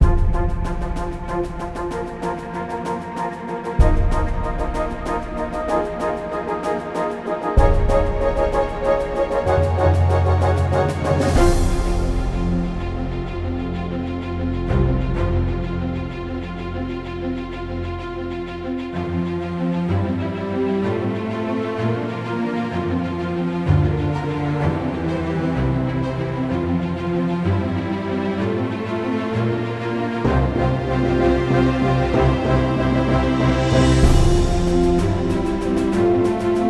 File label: Victory LOOP; Silent Hunter 3